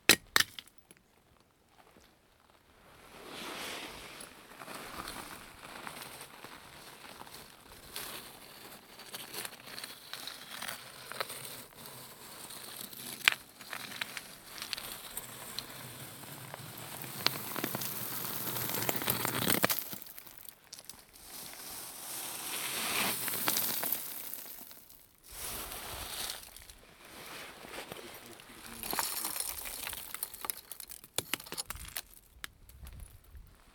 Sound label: Hawaii Mauna Ulu Fissure Stones